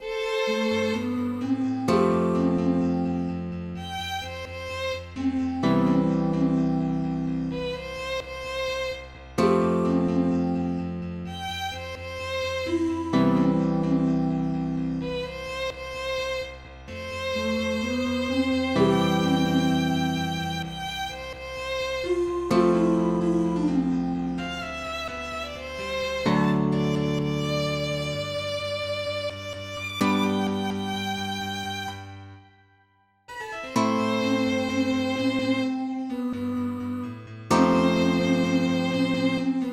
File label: from midi